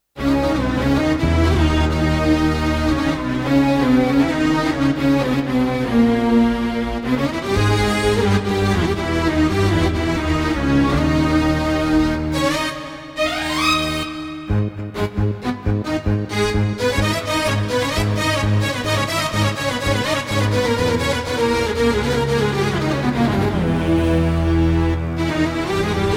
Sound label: Turk Violins; triton extreme